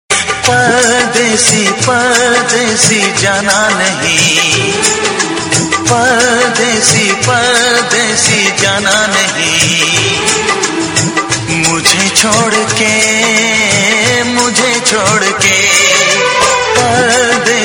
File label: Hindi Sad Tones; Hindi Movie Ringtones